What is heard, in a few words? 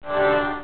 swaaammp; CABOL II 2.0